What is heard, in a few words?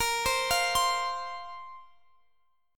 Bb Suspended 2nd Bb Guitar Standard; Bbsus2 arpeggiated; x,x,20,17,18,20; JGuitar